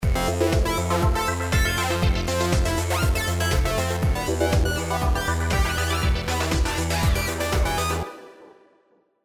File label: osc sync